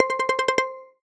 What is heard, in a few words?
awareness default; iOS 27